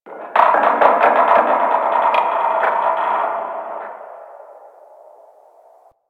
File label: Ocean Underwater Creaks Medium; Ambience; Warframe; Grineer Ocean